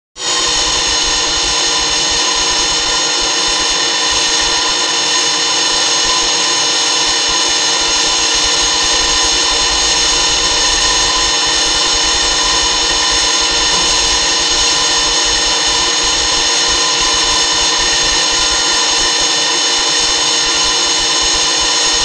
Gyroscope Gyroscope; lasers

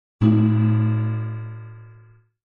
Metal Pan Impact Hi, gong

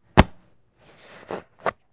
Football Handling

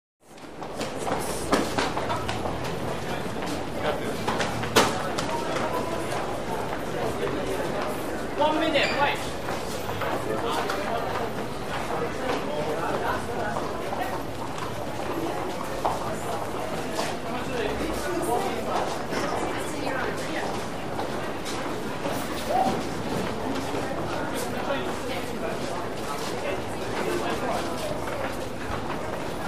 Large Crowd Pedestri, lobbys

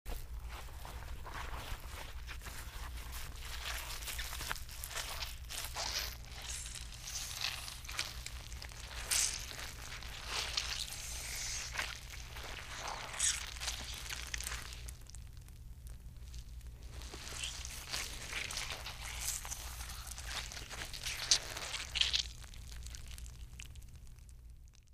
Wet Chamois Squish Sq, cloth, chamois

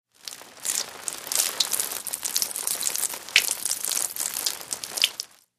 Pour Water Floor Cls U, splashes

